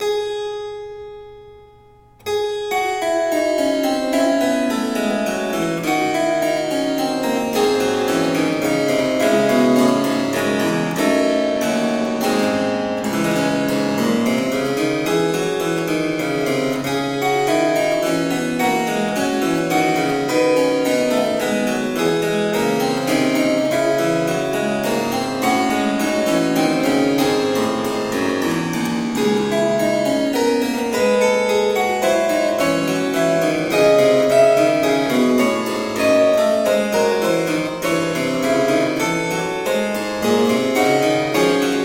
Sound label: chorale prelude for organ; Vater unser im Himmelreich (III); BWV 683; Johann Sebastian Bach; ClavierUbung III